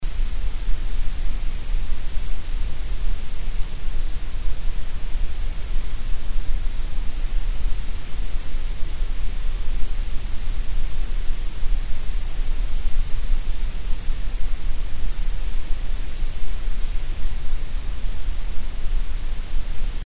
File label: noise grey